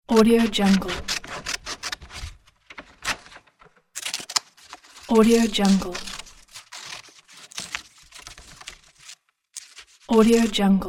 scissor

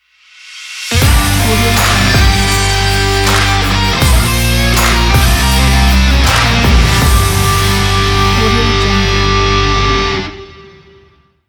western logo

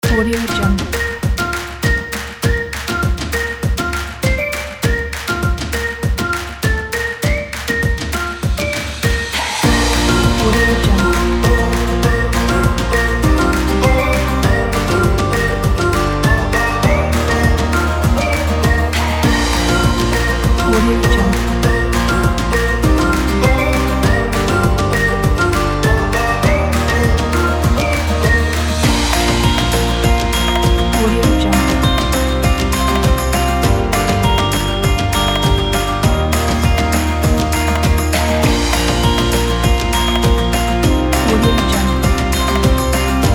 uplifting folk

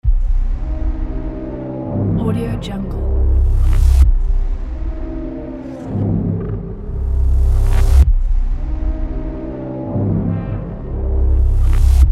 suspense atmosphere

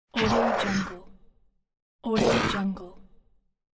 monster quick shout

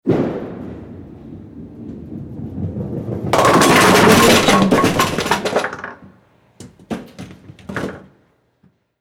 bowling ball travels down lane; sound ideas

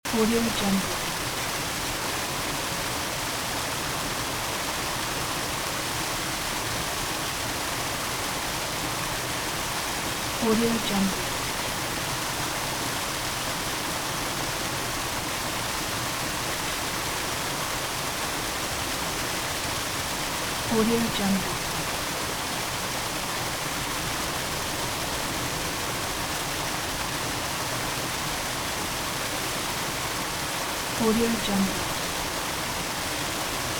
small waterfall loop; fxprosound

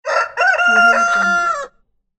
rooster crowing

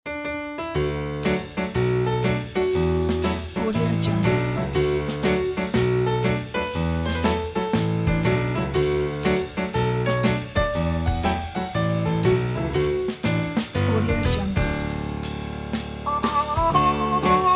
happy and fun; evanni

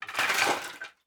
wood sticks fall scrape on concrete; matter mayhem; soundmorph

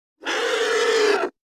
creature mix flying insects flutter vocal

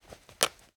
sleeve rip

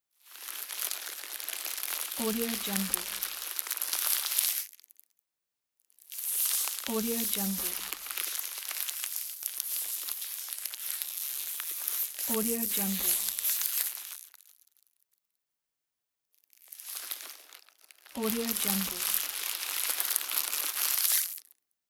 crumpling plastic cellophane; davide martini

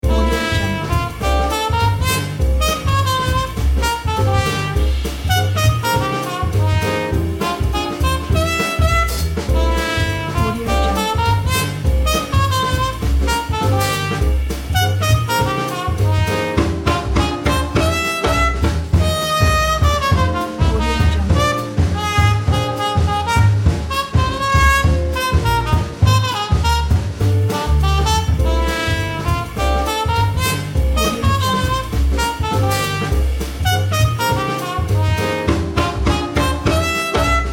nostalgic jazz club music pack

